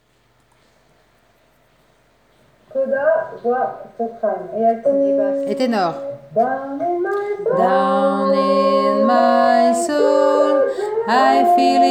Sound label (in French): voix tenor en avant